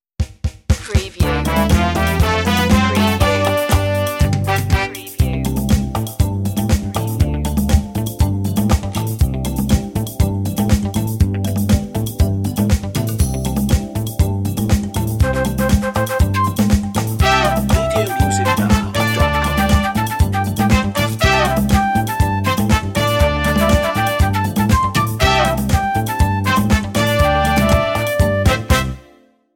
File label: cafe noir